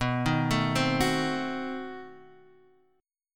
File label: B 7th Flat 9th, down, guitar; B7b9 arpeggiated; JGuitar